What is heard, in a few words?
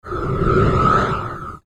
airy smooth phase